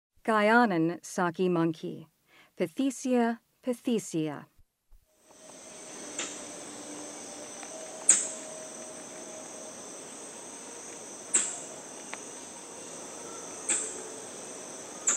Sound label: Guianan saki monkey; Primates of the Rainforest